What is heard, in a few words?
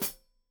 Hat Foot, Hi-Hat, Cymbals Stereo